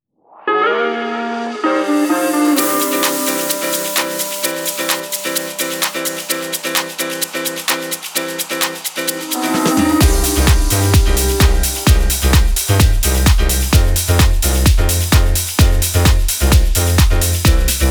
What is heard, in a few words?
(INSTRUMENTAL)